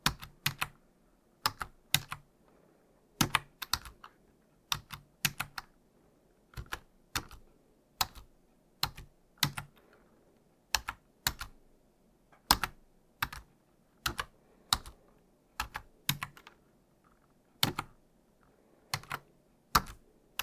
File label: type in